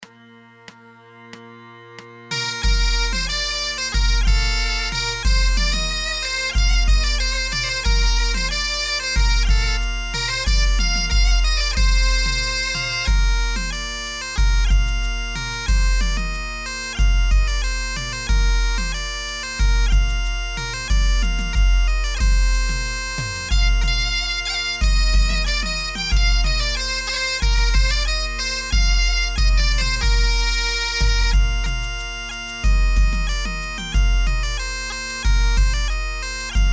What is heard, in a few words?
perc